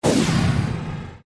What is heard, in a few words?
Fire Spirit Die